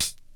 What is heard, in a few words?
old school good hip hop hat sound sample C# key; Duration 0:01; Samplerate 44100 Hz; C sharp